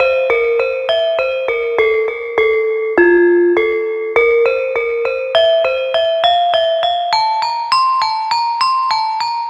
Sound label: Pelog Unison Demung; Melodic; Ambient-Melodics-II